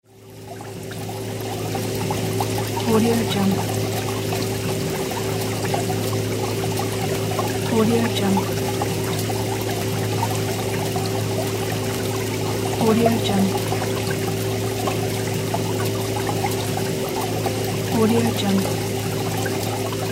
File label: aquarium pump bubbles